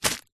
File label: foley packet plastic tightly wrapped small rubber items catch hand